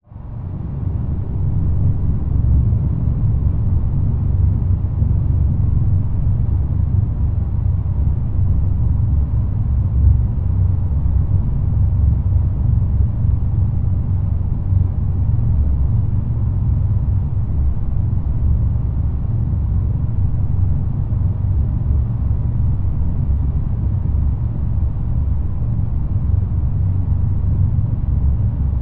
Main Menu Space Sounds; starward rogue

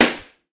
Capsulte Explose Ballon

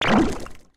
Jello destroy; Super Mombo Quest

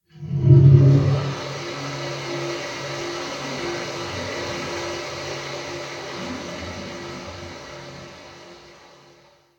Amb Canalisation Amb Far; OneShot

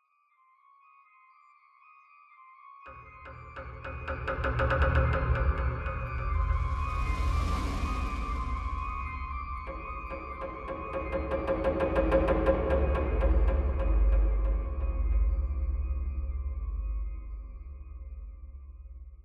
Ambient

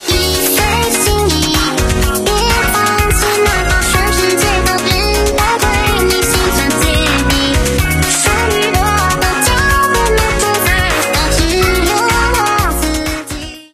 BGM xfade; Invaxion

